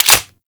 Grenade launcher Mech, Weapons; Warframe; Corpus

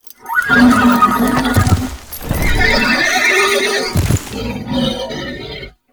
Horse Idle Five Wings; Warframe; Duviri Horse; Vehicles

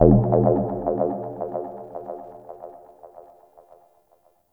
Ominous Techno Hit; Ambience; Warframe; Corpus Railjack

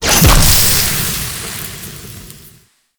Spit Attack Impact; Warframe; The New War; Archon; Nira; Abilities

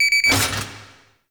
Railjack Weapon Power Ready Three; Warframe; RailJack Weapons; Modular Weapons

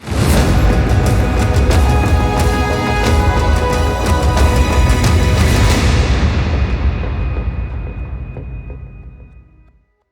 Mission Complete Success; Warframe; Deimos

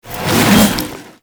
Heavy Melee Dash; Enemies; Warframe; Grineer Nightwatch; Grineer Fortress Heavy Melee

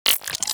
Shotgun Mech; Weapons; Warframe; Tenno; Revenant Shotgun

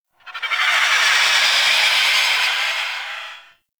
Eye Projectile; Ambience; Gameplay; Warframe; Orokin Moon

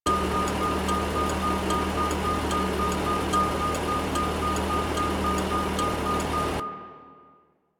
Parked Car Idle Loop; Warframe; Ambience; NineteenNinetyNine

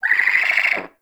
Owl Idle Chirp; Warframe; Duviri; Glyph Puzzle

